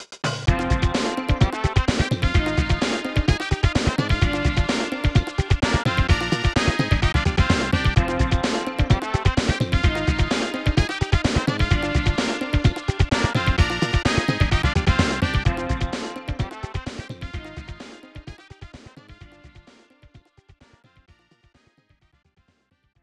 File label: Asian League Victory; international superstar soccer; snes